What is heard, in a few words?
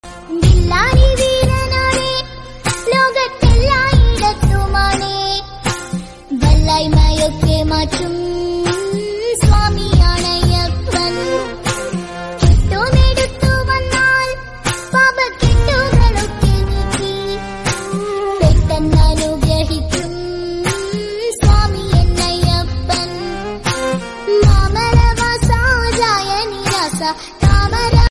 Ayyappa Swamy Slokam Ayyappa Swamy Bhakti